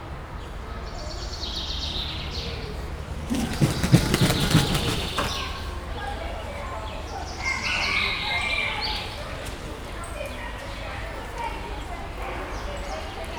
szárnycsapások, kondorkeselyű; nyiregyhazi zoo 2019

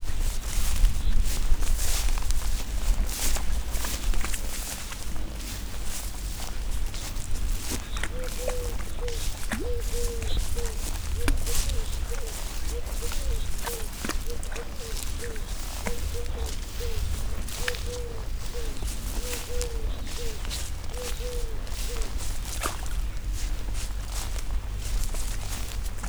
ember elol vizbe ugralo bekak balkani gerle szel; termeszetben

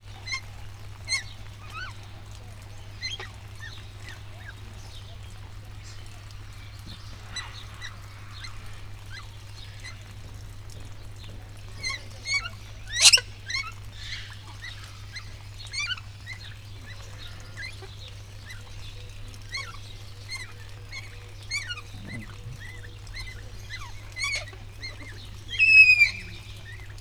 rozsas kakadu; állathangok; nyíregyházi zoo 2010

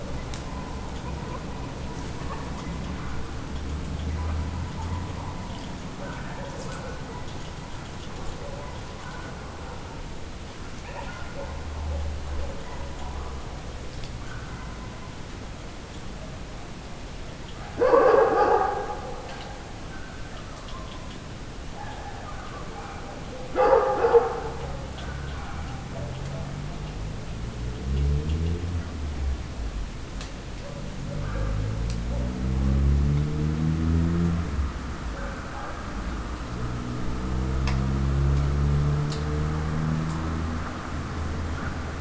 a diófa alma a kutya és az autó; Perkupa

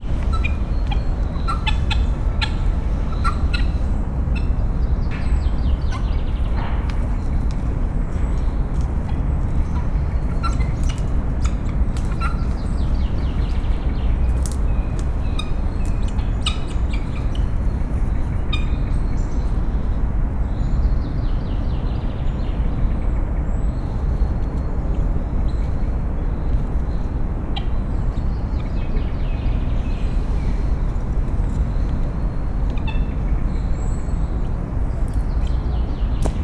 gyöngytyúk; szegedi zoo 2011

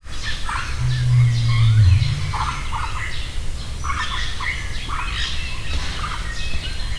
nandu; miskolci zoo 2009

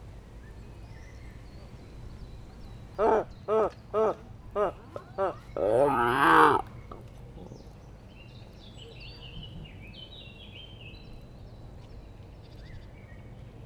hím, dzseladapávián; allathangok; veszpremizoo 2013; akg

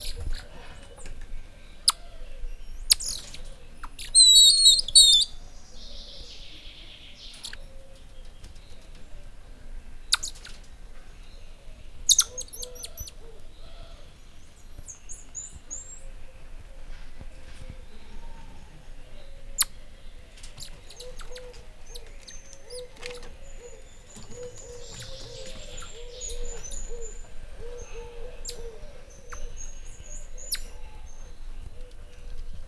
aranyfejű oroszlánmajom; szegedi zoo 2011

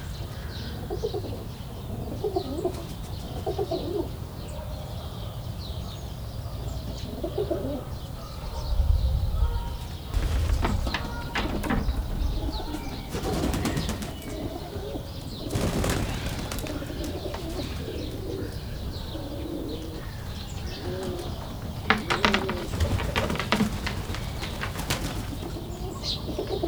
ketrecben, debreceni pergőgalamb; debreceni zoo 2012; marantz puska

